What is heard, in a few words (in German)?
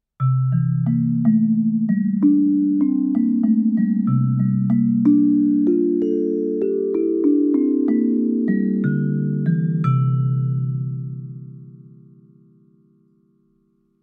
BM 1600 - mit einem Schlägel; studio-49